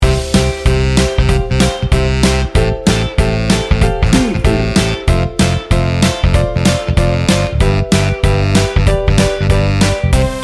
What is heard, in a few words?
60s retro soul